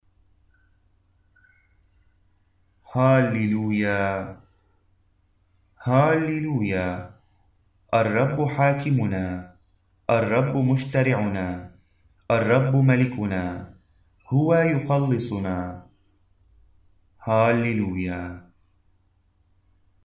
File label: Gospel Antiphon; 1 Saturday of Advent